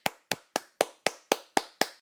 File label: clap, emotes